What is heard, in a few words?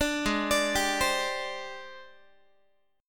Ab Major 7th Flat 5th Ab, down, Guitar; AbM7b5 arpeggiated